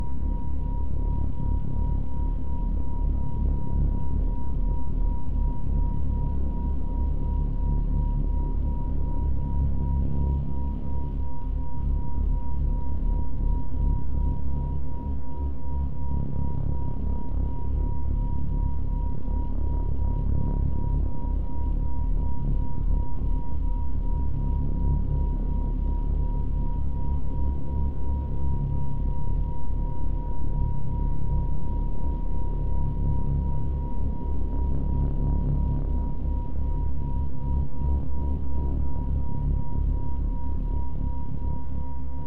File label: Music Drone; Battlefield 3